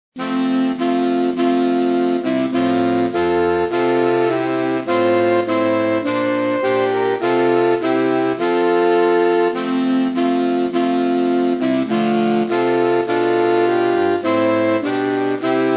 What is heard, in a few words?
sax